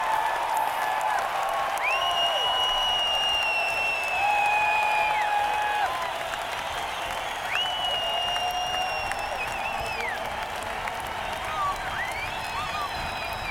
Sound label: Encore Break